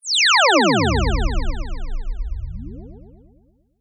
cartoon anime laser ray beam project slow

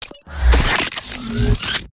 CYBERTECH ACTIVATION; PMSFX

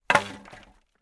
impact metal pole rusty hit loose hard surface

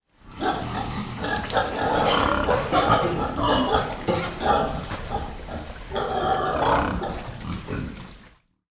animals pigs grunt inside barn wet sloppy mud

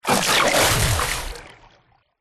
nature water splash deep designed

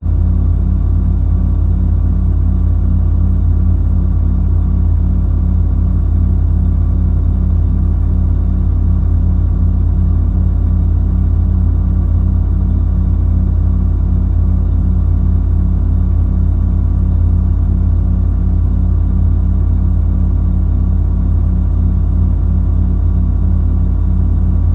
contact mic hum computer case

